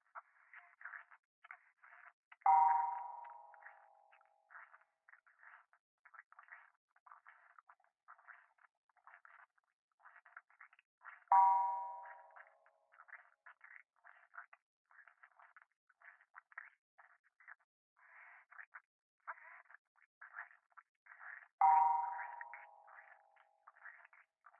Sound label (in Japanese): フェラチオ 寺